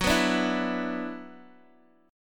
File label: F sharp 7th Suspended 4th Sharp 5th, Guitar, Open G; x,x,4,4,3,2